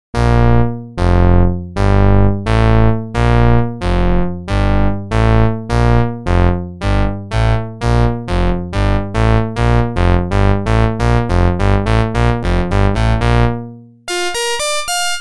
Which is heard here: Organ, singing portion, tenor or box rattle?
Organ